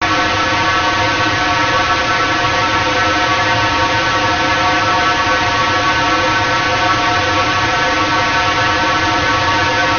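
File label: stationary noise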